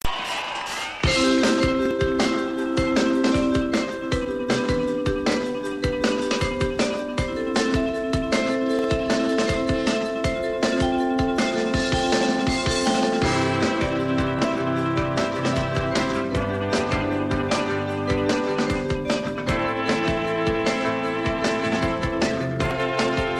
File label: Ring The Bells